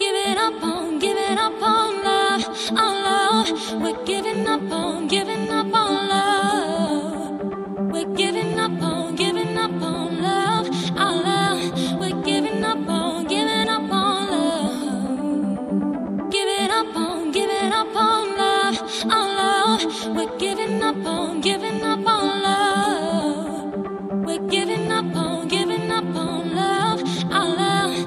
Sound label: happy music 432 HZ